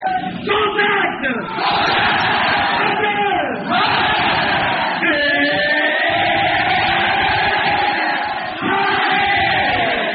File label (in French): contact moteur